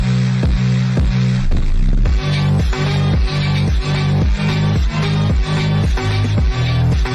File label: phonk